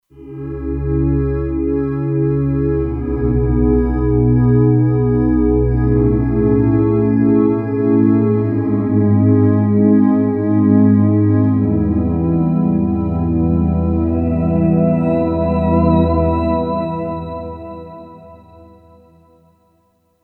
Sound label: Glass Bow, Kurzweil K2500X; Factory Programs